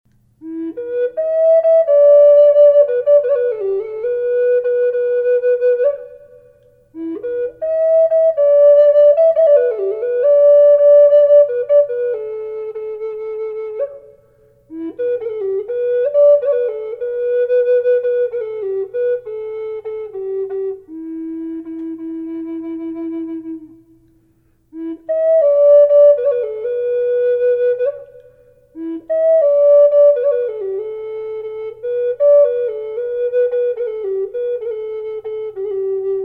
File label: falcon reverb e minor, flute; collector flutes